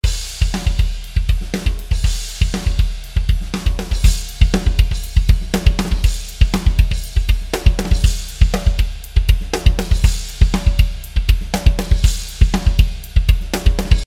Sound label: Unmix Drums, Drums; Zynaptiq; Preset „Add some Impact“